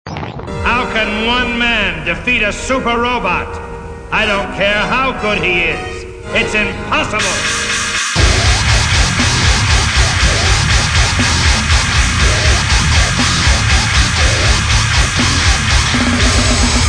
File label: drum machine gun